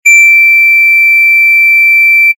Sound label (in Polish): ton